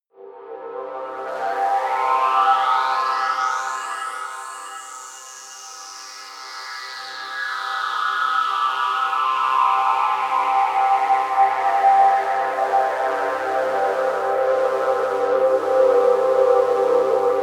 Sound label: pad sweep highpass; flaechen